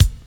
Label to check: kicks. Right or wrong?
Right